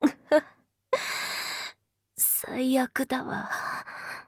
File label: giggle